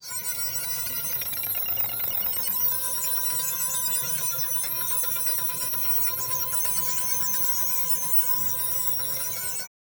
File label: weapon